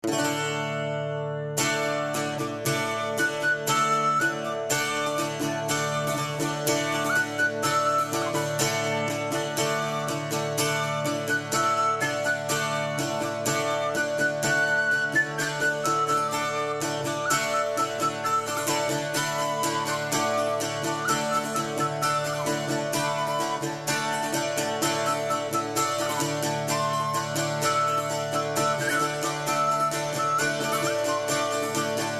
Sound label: cister galoubet